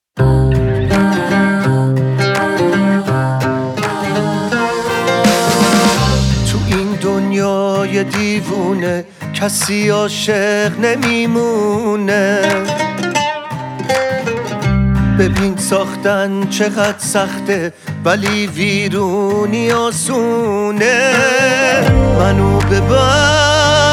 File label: Acoustic Version Live